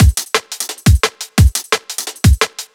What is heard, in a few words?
Drum Loops, 174; M8; Electronisounds